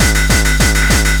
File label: drumloop; awe32